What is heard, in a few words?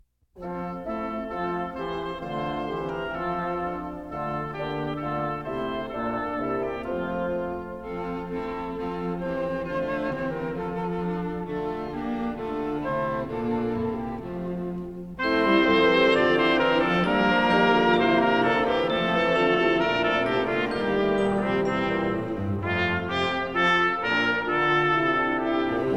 Angels We Have Heard On High, instrumental; French traditional